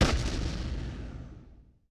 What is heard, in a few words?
WWII Small Blast, Period Combat; Sony Pictures Sound Effects Series